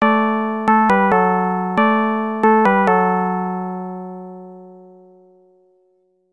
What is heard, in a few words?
Get Item, Correct Answer; Spiritual Warfare; DOS